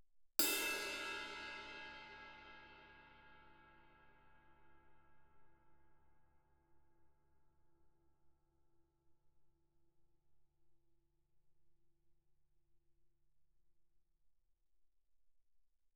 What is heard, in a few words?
crash stick normal ff, suspended cymbals; Percussion